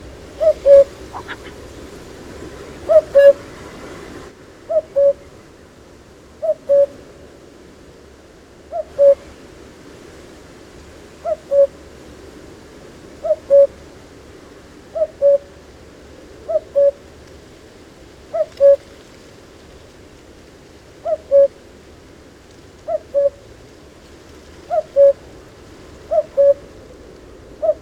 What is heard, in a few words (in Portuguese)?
Canto do Cuco